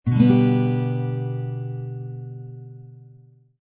Guitar Chord, A simple G major chord played on acoustic guitar